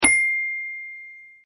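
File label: xylophone; Toy Story 3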